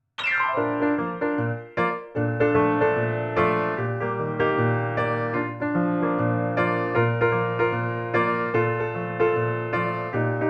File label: Light Jazz